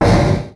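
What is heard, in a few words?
Collision Interior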